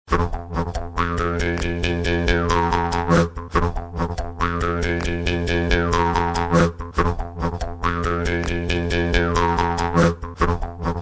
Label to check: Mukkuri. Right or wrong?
Right